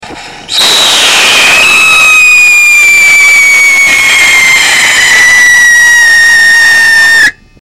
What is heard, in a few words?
sodium benzoate core ball milled, rockets whistle tests; Iron Oxide; Core Burner; Very raspy start; The whistle was pressed to approximately 6600 pounds in the motors.